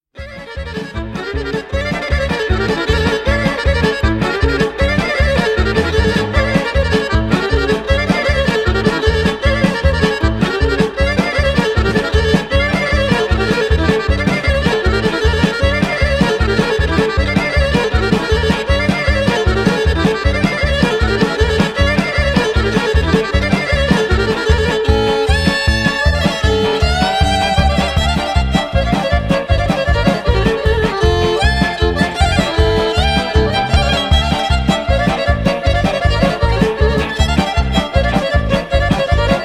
kolo; zvecansko kolo

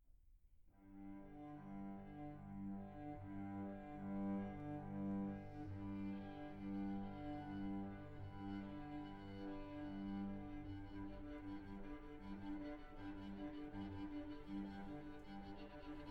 trio elegiaque no. 1 in g minor

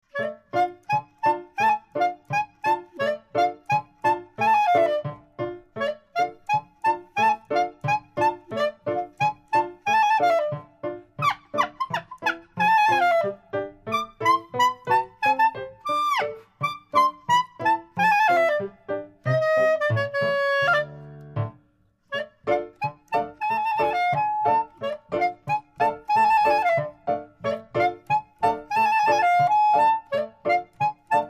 nigun espiegle

